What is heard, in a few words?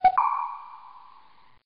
turret detect